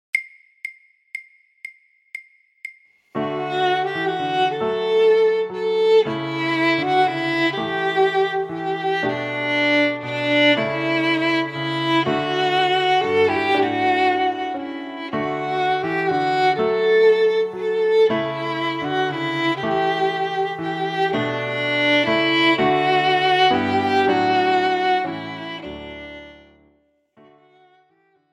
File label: Andante Grazioso; Mozart; Aus der musikalischen Schatzkiste 1 - Viola